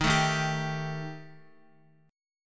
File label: D sharp Major Flat 5th D sharp Guitar, chord; strummed; chordshape; x,x,3,2,3,3; JGuitar